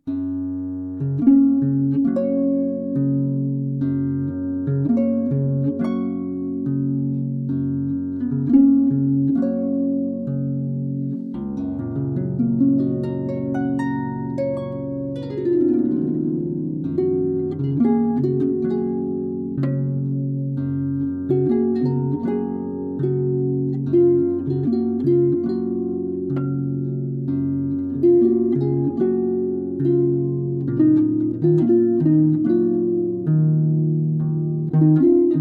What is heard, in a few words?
Harp